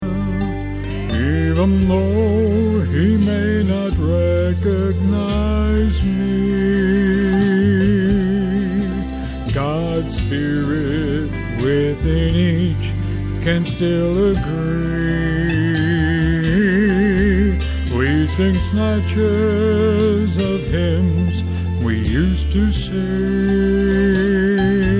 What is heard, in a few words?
male